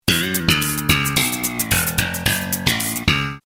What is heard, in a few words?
sitcom transition bass riff; seinfeld